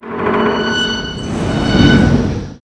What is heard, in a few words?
gate fall; hl2 ep2; ambient, levels, outland